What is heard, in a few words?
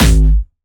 Jumpstyle Kick G#1; Bassdrums; Jumpstyle Multis; vengeance essential club sounds-3; VEC3 Bassdrums